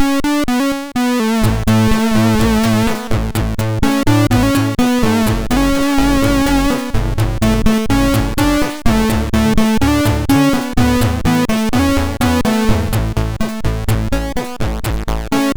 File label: Breath, MOS6581R2